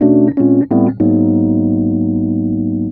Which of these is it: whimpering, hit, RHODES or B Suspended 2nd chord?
RHODES